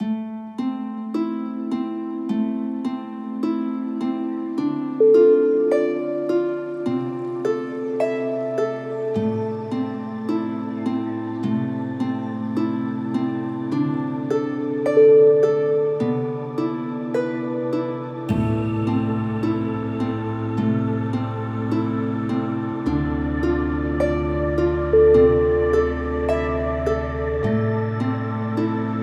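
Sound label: celtic forests, music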